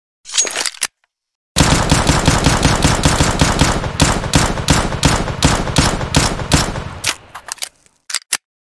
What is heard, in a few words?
five seven; csgo